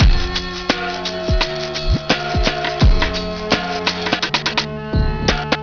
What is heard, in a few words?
snare